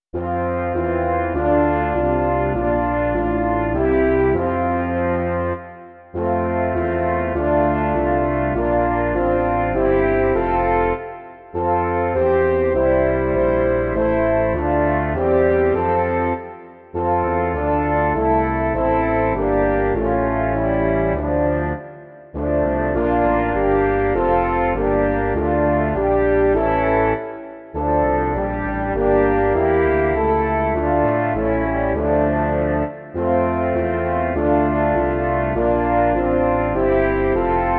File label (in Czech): trio lesních rohů a tuba; Ó králi věků důstojný; Imaginativní verze